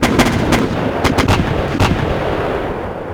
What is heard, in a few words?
m107 mortar 120mm fire distanced offmap